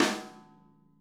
SNARE, Interactive Drum Kits; Sonic Reality; ID SNARE 3+

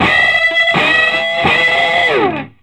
SOLO E, Vintage Blues Guitar

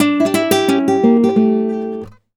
Flamenco, Guitar, a

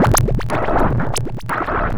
Processed Perc

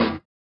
SWUSH; Spectrasonics; Metamorphosis

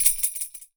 YAQUI; AKAI S6000 CD-ROM - WorldSounds

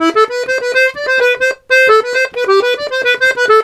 TARANT, Complete Accordions; USB Soundscan vol.40; AKAI

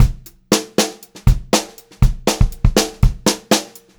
120 SPEED, Pop-Rock Drumloops; USB Soundscan vol.37; AKAI; 90 s Sample CDs

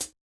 Yamaha DJX 2B, Hi-Hat Pedal

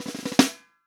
REAL DRUMS FUNK KIT

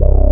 CHORUS BASS; SAMPLE WAVE MIXING 1

